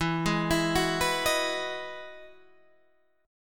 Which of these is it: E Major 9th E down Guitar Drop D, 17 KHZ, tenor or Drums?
E Major 9th E down Guitar Drop D